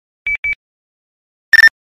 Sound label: nextel beep beep ringtone